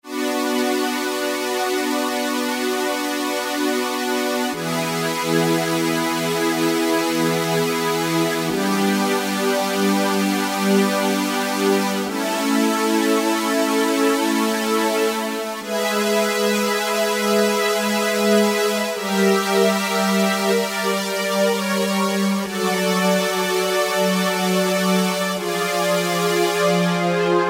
PWM Strings, Korg microKORG; Factory Voices

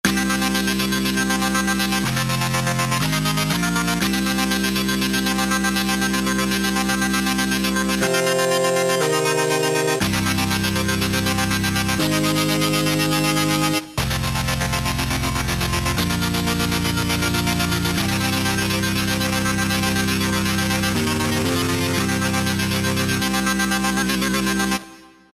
LO-FI TECHNO, Roland SC-880; Factory Patches

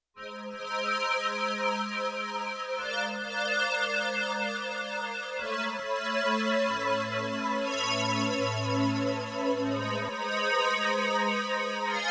Bellbows, Yamaha TX16W; Spacey Keys

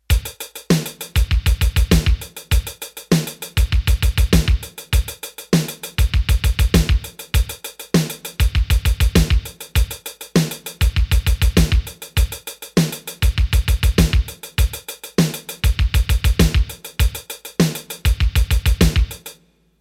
Elec Pop, Roland D-10; Rhythm Demos

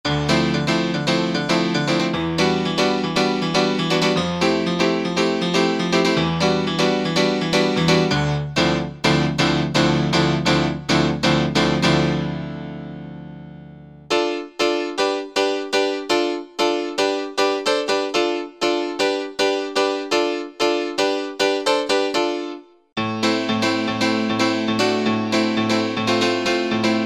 House Pno

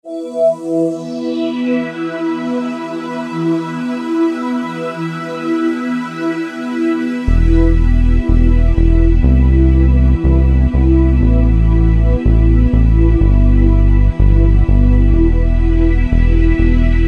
New Age Split, Roland SC-880; Factory Patches

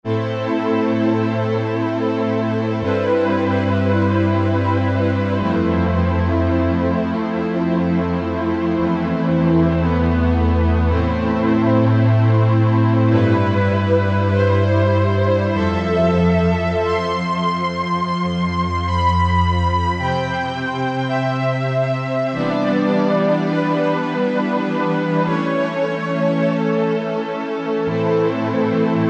Saw Pad, Korg MSC-12S Synth; Synth 3

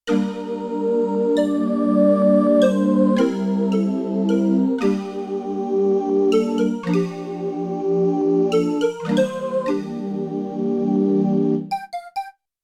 Calliope-Vox, Yamaha TX16W; Combo Performance 2

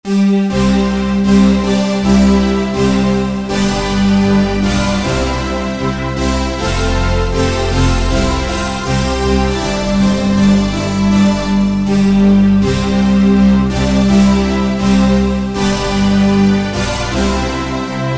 Korg TR-Rack, Combi

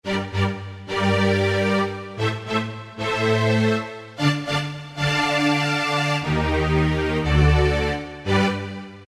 Lush Strngs; Factory Preset examples

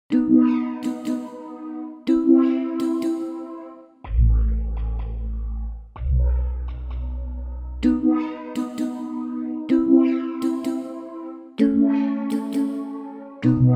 Roland JV-880; Factory Patches

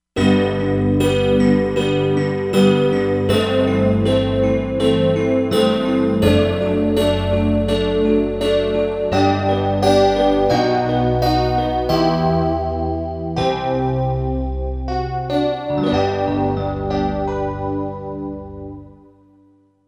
Digi Tyne, Korg MSC-07S Synth

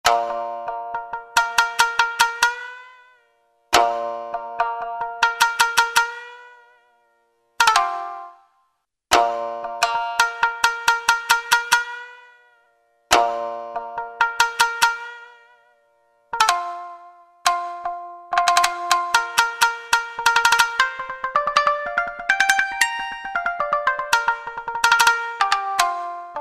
Shamisen; World; Roland SR-JV80-05

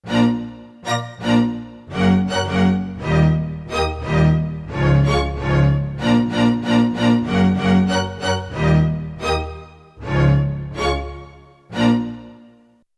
Long Decay, Orchestra Hit; Orchestra Hit 2

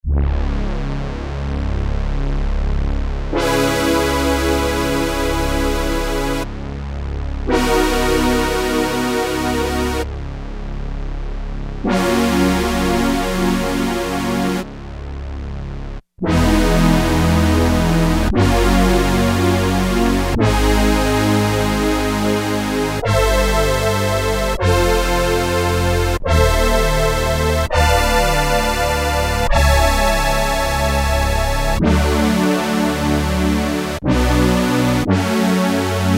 Roland MKS-70; Is This Fat

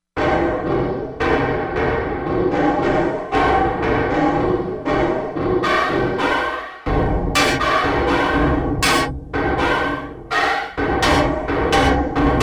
SFX, Korg MSC-16S; Environment

